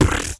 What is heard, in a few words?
Human Beatbox, Beatbox Hits